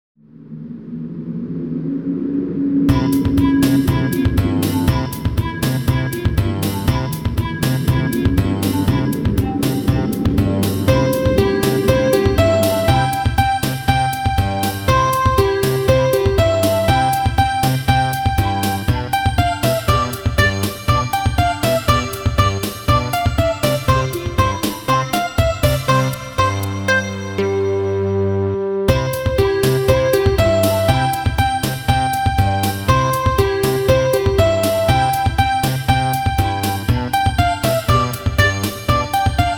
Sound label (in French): VERSION KARAOKE; Alex et Zoé 3